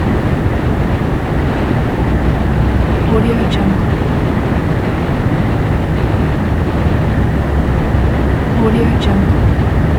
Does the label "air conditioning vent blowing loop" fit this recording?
Yes